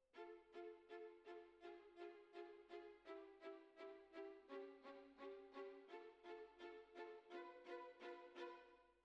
Cellos Over

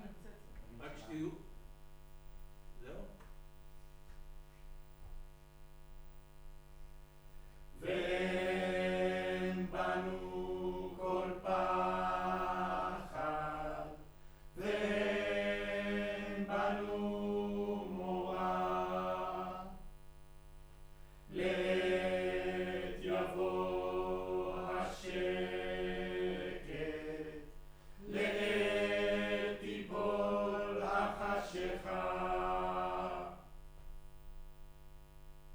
Room, Choir

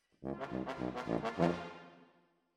Tromb ASS

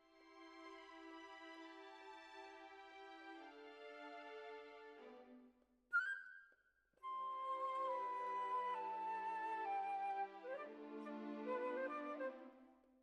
Clarinet

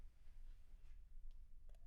Children Choir; Studio Bekol Children Choir

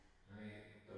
Bass

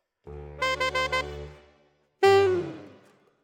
Alto, RNR for piano

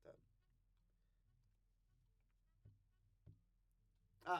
Trumpet

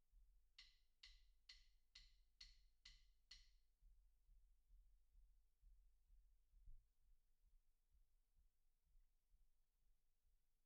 BD In

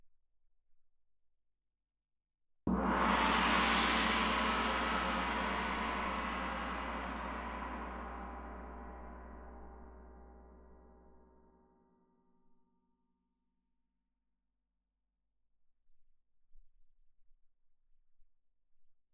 Tam-Tam Main

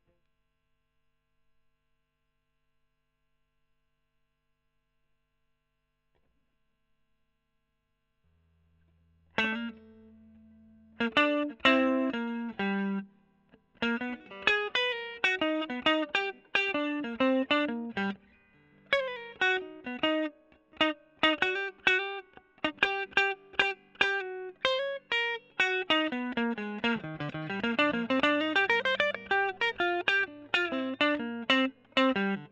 E.GTR Gefell Solo

